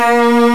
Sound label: LOW STR, FAIRLIGHT CMI IIX STRINGS